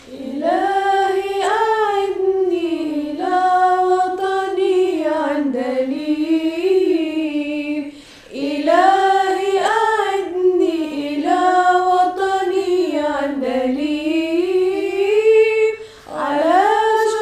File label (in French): Voix a cappella